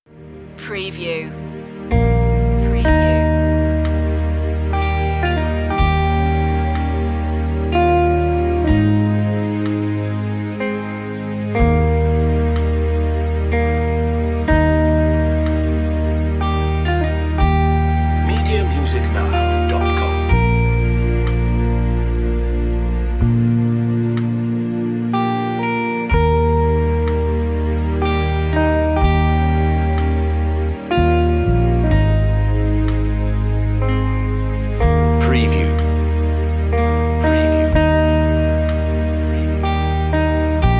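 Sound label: amazing grace very slow verse 62bpm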